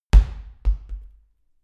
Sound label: medicine ball bounce, fitnessstudio; sport freizeit